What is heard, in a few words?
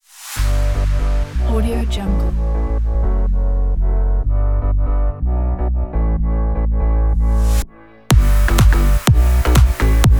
euphoria summer dance